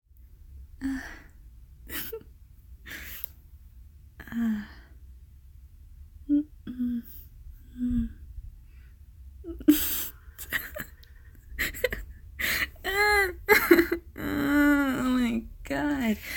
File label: uuugghhh